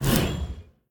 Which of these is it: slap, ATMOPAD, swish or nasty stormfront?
swish